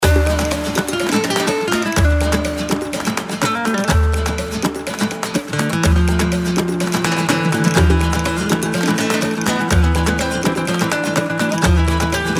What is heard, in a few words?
reelspin fg; Bull Stampede